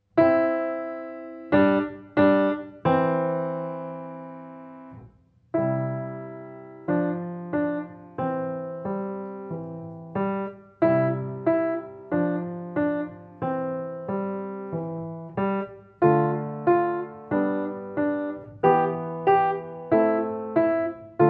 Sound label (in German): Wiegenlied